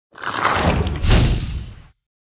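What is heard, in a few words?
mutantfish attack; monster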